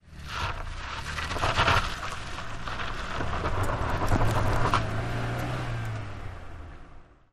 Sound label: Car Tires Gritty; Peel Outs, On Board Perspective 5x